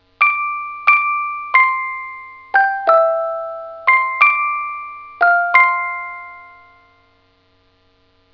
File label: Musical, Musical Box; The Musical Box (GCM818); The full tune